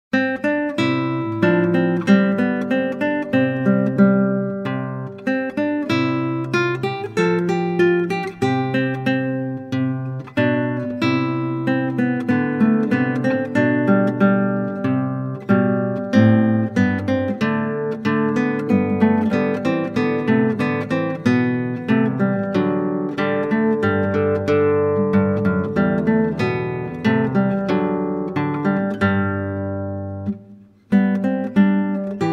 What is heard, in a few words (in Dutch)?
Klassieke Gitaar; Bach 50 Solos